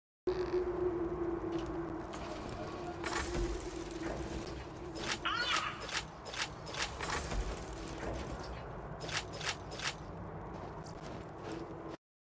kiste oeffnen und gegenstaende aufnehmen; borderlands 2

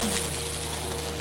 biene riesig; botanicula

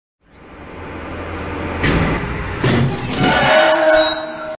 lift bleibt stecken sound; The Dream Machine

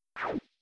sichel erfolglos; harvest moon

